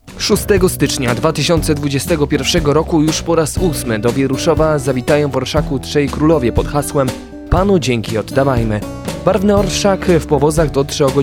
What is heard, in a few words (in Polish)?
Jingiel; OTK Wieruszow 2021